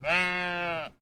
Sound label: Sheep, sheep bleat outdoors; SyrinScape; Waterdeep